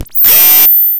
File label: Roland Sh-101; Drums